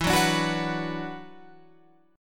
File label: E 7th Suspended 2nd, down, Guitar, Open F; E7sus2; strummed